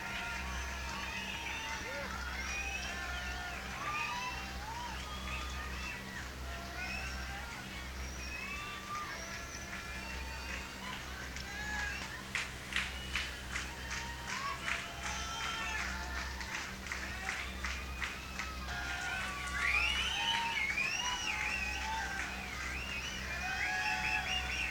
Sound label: Crowd